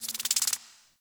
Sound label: Insect; Liset Alchemy Lab